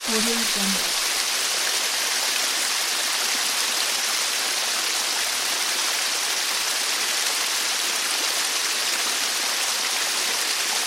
waterfall

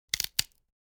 human bone fractured; sound-ideas

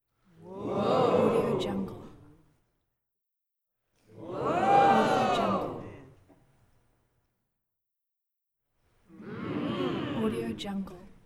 crowd ohhhs ahhs mhmmms

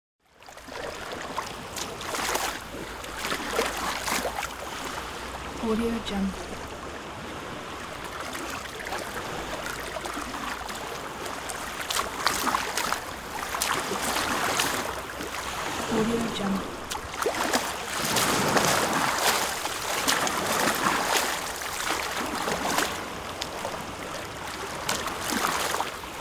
sea

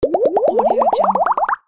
bubbles growth

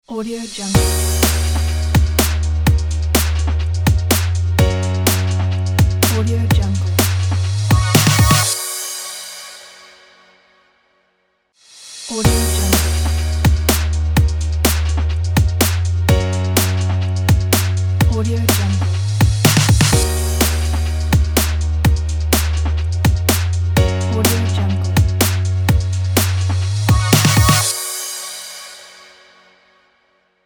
pop piano logo

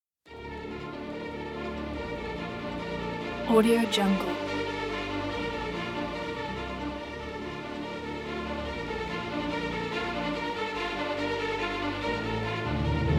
heroic intro

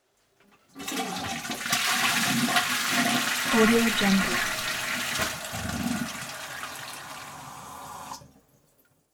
toilet flush

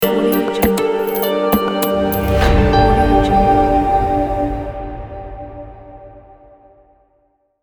documentary sting logo

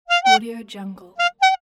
clown horn

